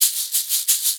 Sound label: ETHNO EXTRA 90; ethno2